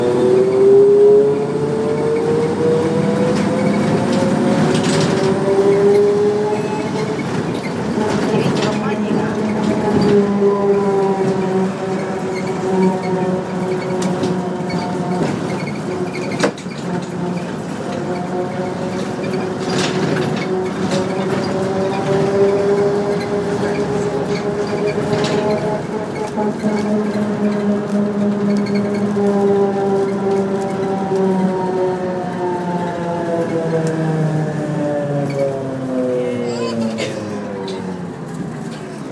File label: noisy bus engine